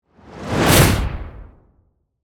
Magic Wand Cast, Horror, Magic, Wand, Cast, Spell, Heavy; Magic Sound Effects; BlastWave